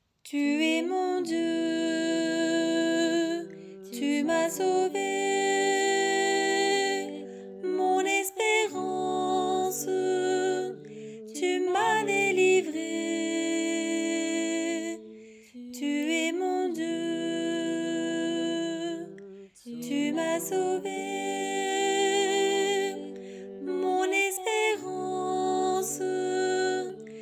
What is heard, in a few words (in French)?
soprane